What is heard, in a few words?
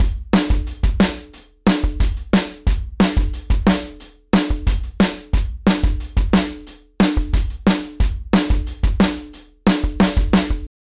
Drums; Zen Master Demo